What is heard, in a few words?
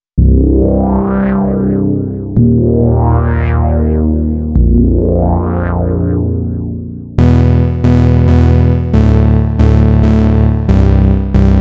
massive basstec